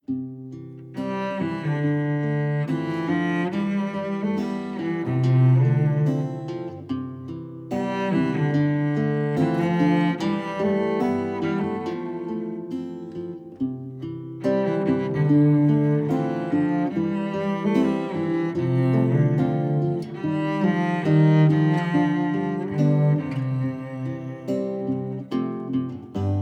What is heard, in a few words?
Guitar and Cello